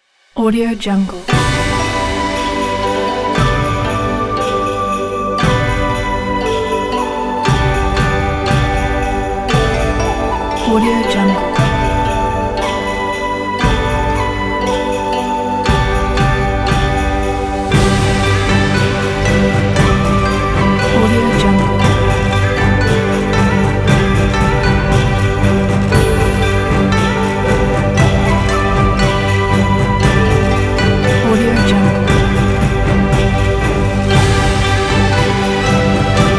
china new year

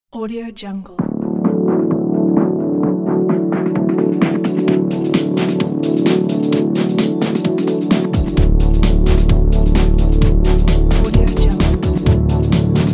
fashion style modern beat intro ident

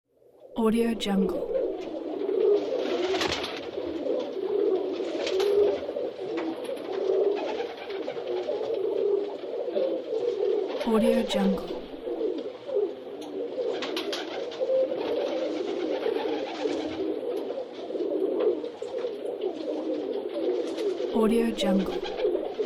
dove

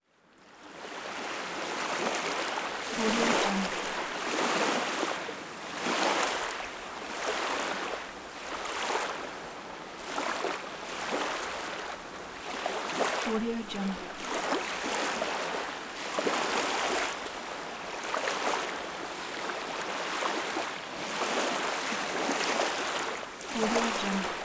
waves

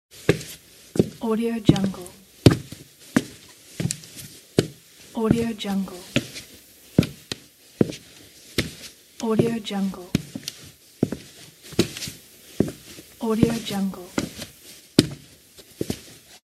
footsteps cowboy walking

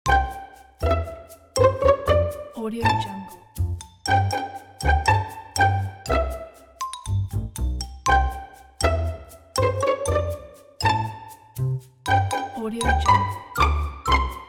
cartoon cute carribean fun

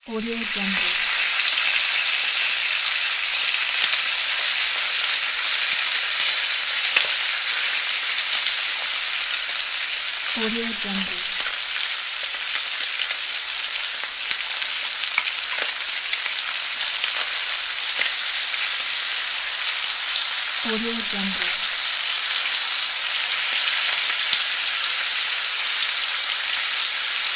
frying; fx-library

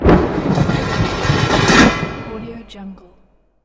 thunder metal impact

